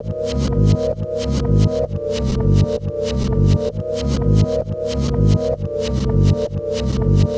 Chd Fx Loop 130; Equipped Music; Loungin House

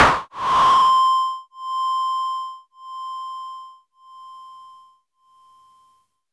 FUNK, FX; USB Soundscan vol.47; AKAI

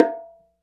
New Lo Bongo, Roland BK-5; Percussion

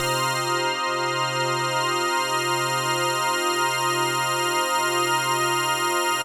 MASS C3, SYNTH; AMG - Global Trance Mission VOL-2

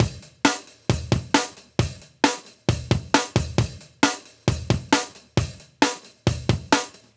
134 live breaks

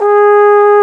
BRS BONE, Trombone; Roland L-CDX-03 Disk 2

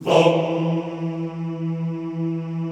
Classical Choir Hmmm E2; Best Service; Extended Classical Choir

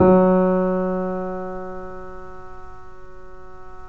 3D Pianos Yamaha C7; E-MU Producer Series Vol. 5

